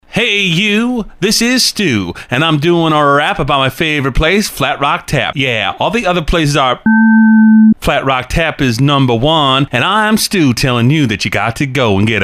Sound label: Flat Rock Tap